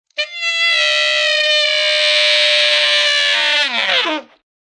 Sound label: Sax Scream, A saxophone scream; Horns; Musical